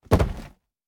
Water Splasher; rabbids multiverse; iOS, macOS, Mobile

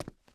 Footstep Run Base; starbites

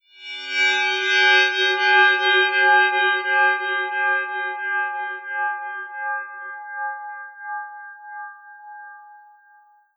Metallic Glimmer Drone

